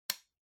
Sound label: Vacuum Cleaner Switch; 掃除機01-08(スイッチ)